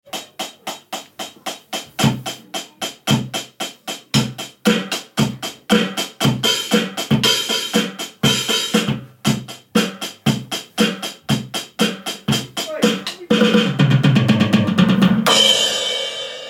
drumming

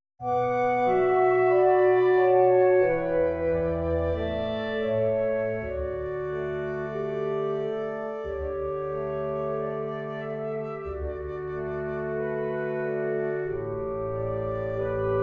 backing track

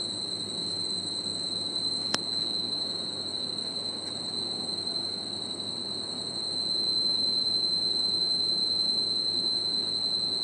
screaming milk

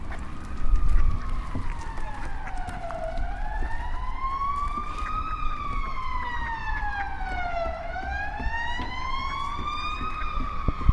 police siren

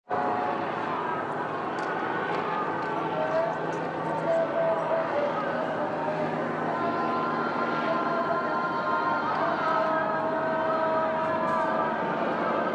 call to prayer

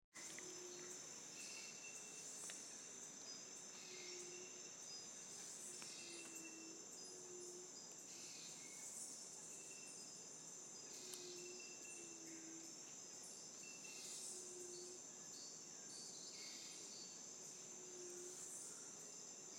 rainforest sound in guadeloupe

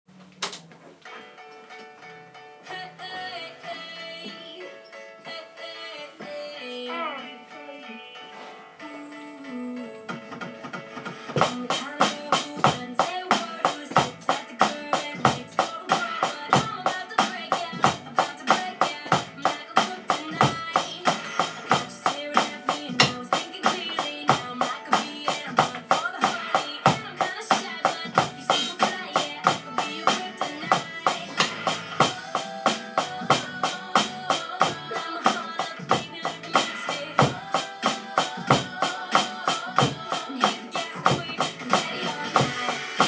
drums with track

